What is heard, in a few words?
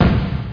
lose game